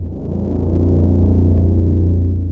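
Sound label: throb loop, ships engine; Quantum Redshift; XBOX; marcus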